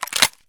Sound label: rem870 pump out; Escape from Tarkov